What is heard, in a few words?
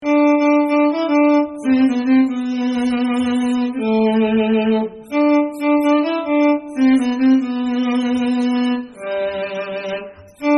SWAM Cello